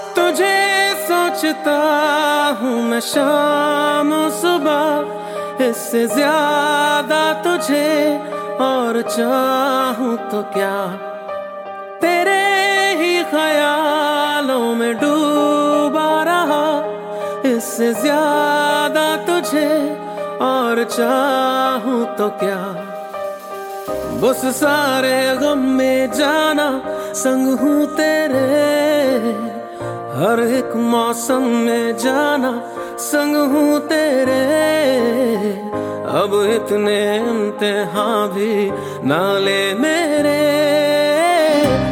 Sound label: Hindi Song Ringtone